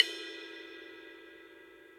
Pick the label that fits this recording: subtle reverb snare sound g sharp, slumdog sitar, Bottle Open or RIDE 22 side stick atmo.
RIDE 22 side stick atmo